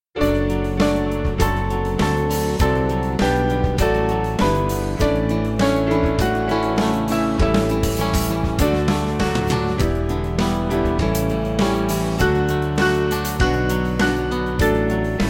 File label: Band